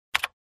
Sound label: Computer Keyboard Key press soft